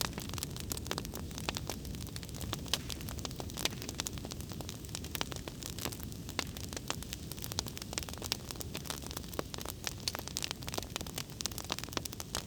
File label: Torch Fire Loop; SteamVR; InteractionSystem